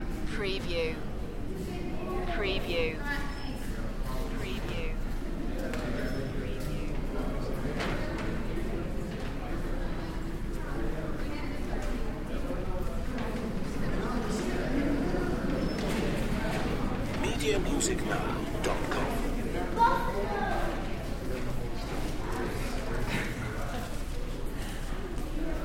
Light Crowd Walla, Small Hall, Mammal Hall, National History Museum New York